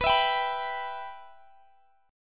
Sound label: B Suspended 4th Sharp 5th, Guitar, Open G; x,x,21,21,20,21